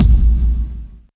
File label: Hallkick; FX; Vengeance Samples